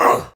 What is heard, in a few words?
Pain; Warframe; Tau; Dax; Ashigaru